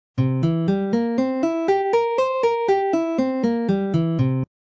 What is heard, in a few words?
c dominant seventh arpeggio pattern, guitar